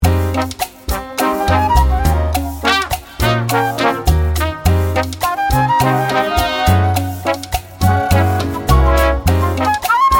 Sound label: chill latino